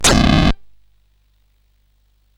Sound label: Shuffle, CSG8580R5; hvsc